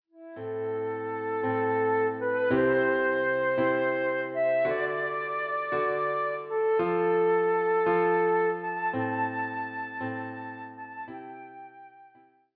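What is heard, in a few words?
The House of the Rising Sun, flute; Klavierbegleitung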